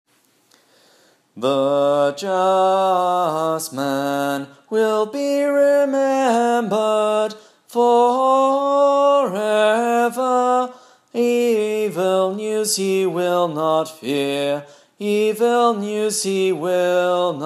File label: Communion Hymn; Cyprian